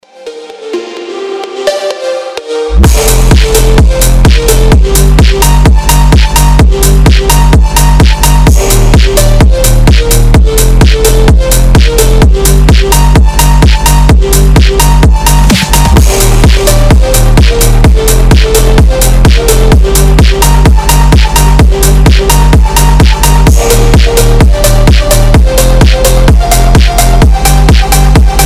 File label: phonk mix